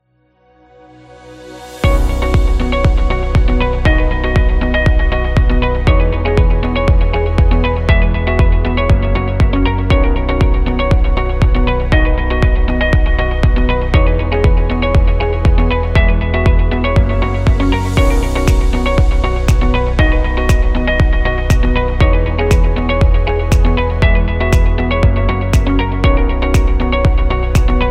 Corporate Elegant; conference

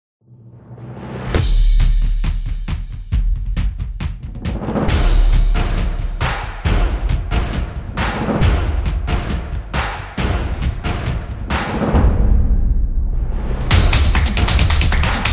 Drumming Sport

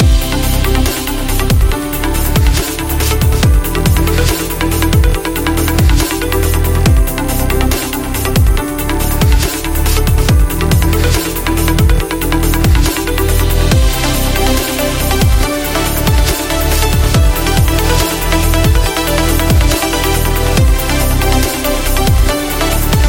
Hi Tech Loop

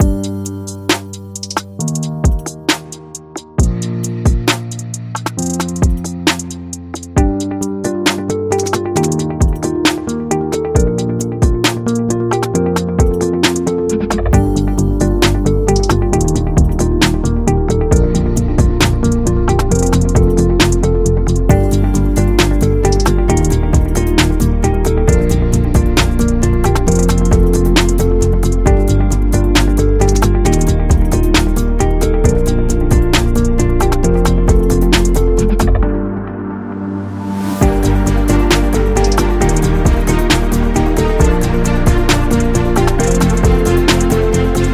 Elegance; medical background

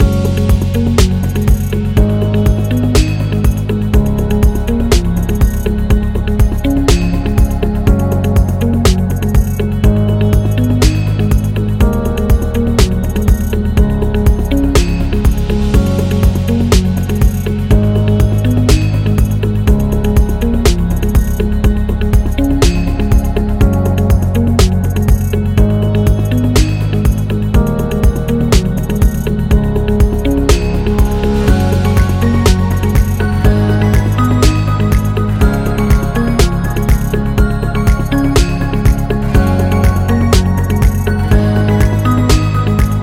Commercial Loop

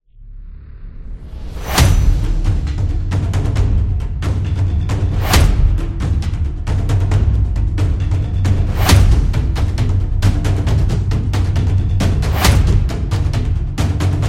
Cinematic Drum

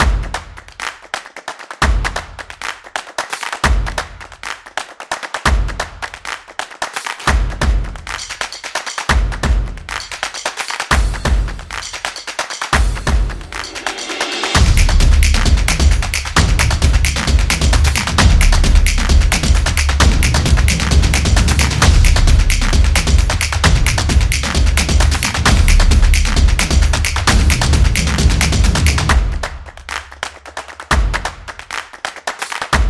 A Percussion

